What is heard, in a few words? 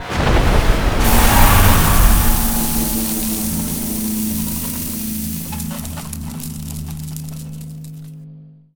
cryo ice melter; Quake 4 Alpha; id software